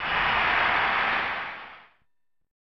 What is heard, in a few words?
Applause; super baseball 2020; snes